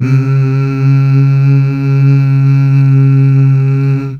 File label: Mmh Male; Best Service Gigapack 1; CH BACK6